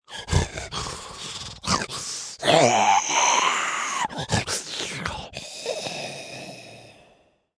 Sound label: Creature Small Beast, Monster creature, small beast, gnome; Sickening snarl, sneer, disturbed breathing